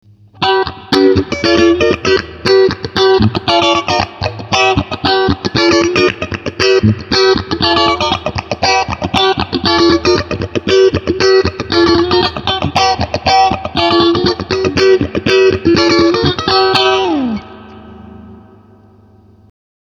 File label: funky; tc electronic pedales classic